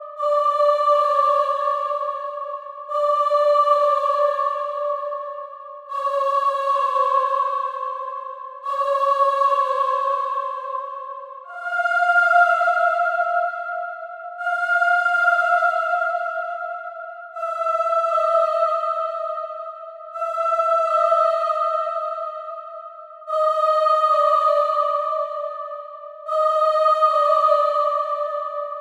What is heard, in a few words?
Spooky Ringtones